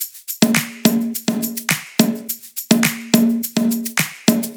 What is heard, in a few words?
Drum Loop (no Kick)